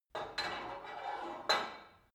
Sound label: put light weight onto barbell soft, fitnessstudio; sport freizeit